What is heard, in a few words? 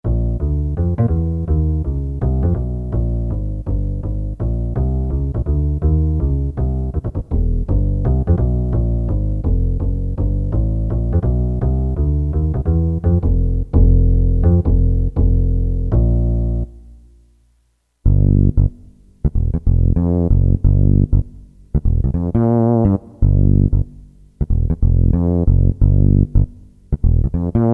Bass - Syn Sfx, Roland EM-10